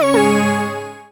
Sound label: notification mobile